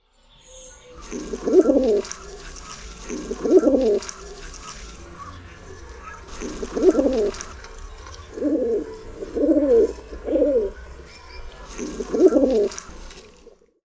golab

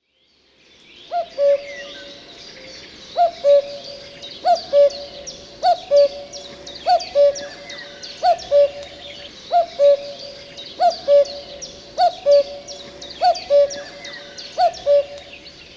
KUKULKA

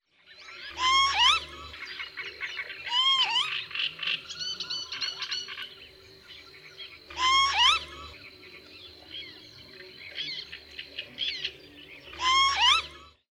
czajka, ptaki

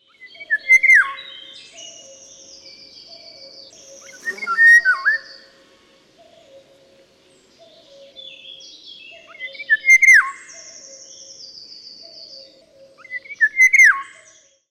wilga

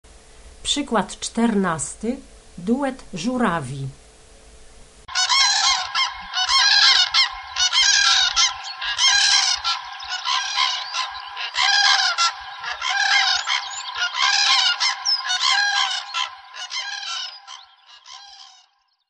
duet żurawii

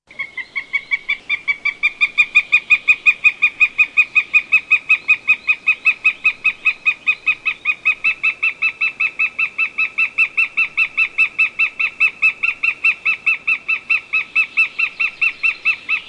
Ptaki - Jastrząb gołębiarz

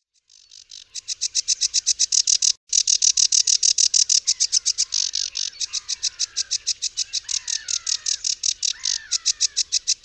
rokitniczka

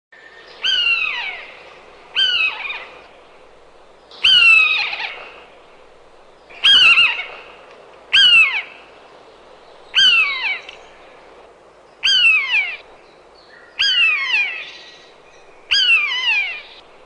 Ptaki - Myszołów

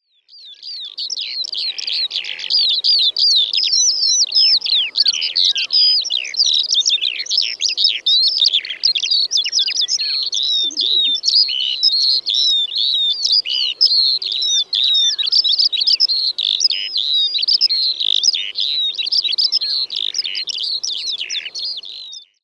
skowronek, ptaki

